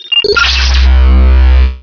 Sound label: use; PC Gamer IT CD 41; Daikatana